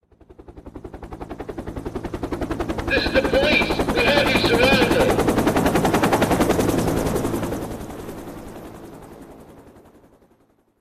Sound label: Swat Timer; friday the 13th killer puzzle